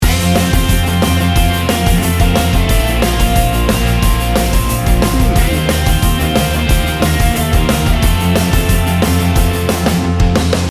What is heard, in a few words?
pop rock party loop